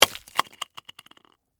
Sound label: Impact Ice Block, Hammer; Break, Piece; Miscellaneous Impact